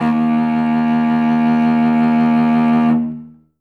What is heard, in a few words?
D#1, Cello solo; Best Service ProSamples vol.51 - Classic Orchestra 2